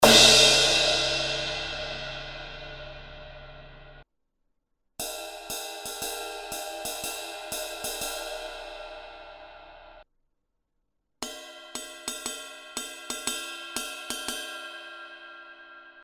zildjian 18 inch k custom fast crash crash ride bell; cymbal